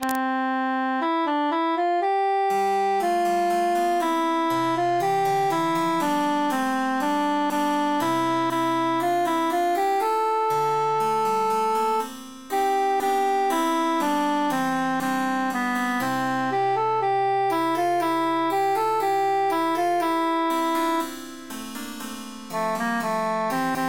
how merrily transposed cantus emphasized

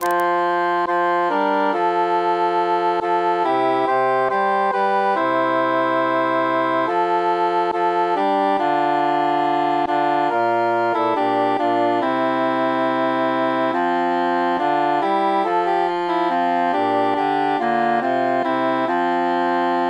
tenor mute; tant que vivray

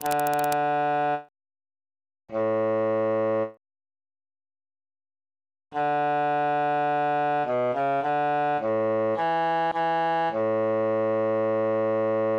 transposed bassus solo; sister awake

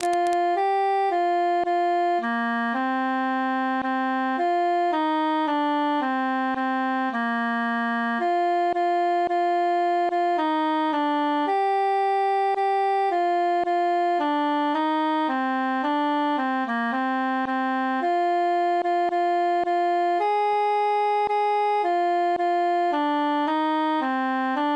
altus solo; this evenfall tis snowing

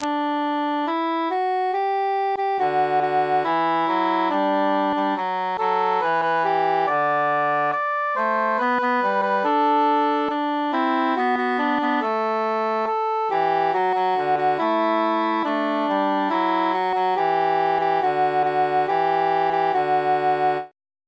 altus mute; non nobis domine

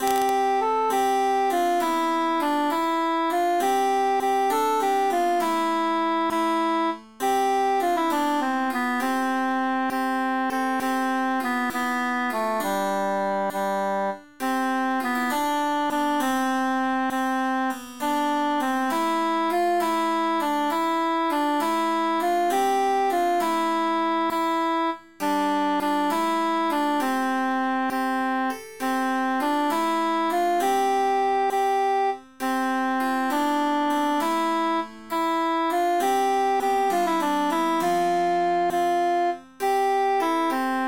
cantus emphasized

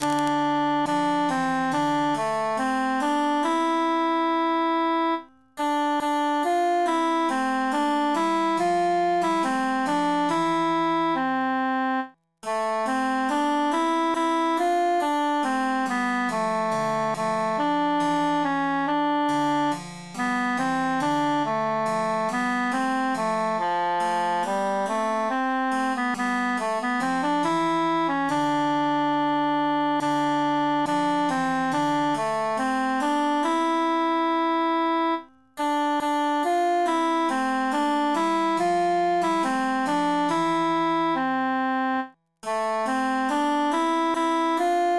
cantus emphasized; now wolde y fayne

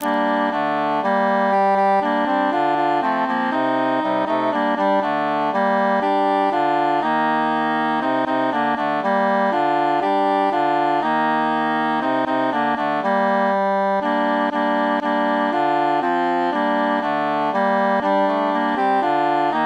cantus mute; la la la

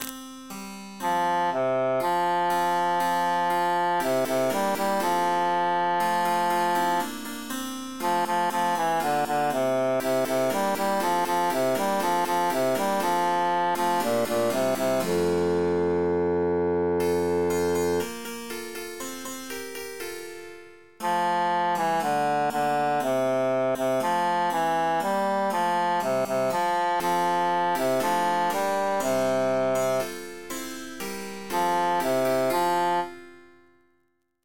bassus emphasized; psallite unigenito

